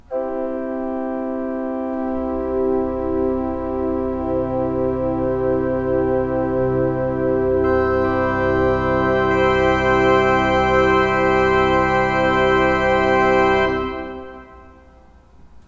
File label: Crescendo, pipe organ; st brendan cc